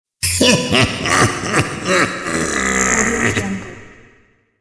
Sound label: monster laughing